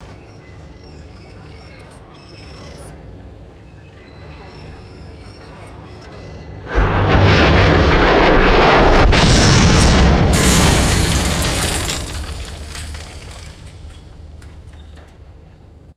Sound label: large metal aircraft fuselage creaks and drops